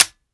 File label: fire dryfire; gmod; viper